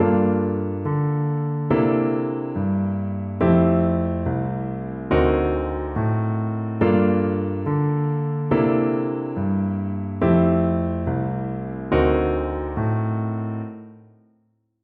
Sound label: jazz improvisation exercises, piano